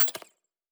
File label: Glitch, Electric